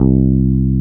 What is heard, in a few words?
SOFT BASS; AKAI S6000 CD-ROM - Ultimate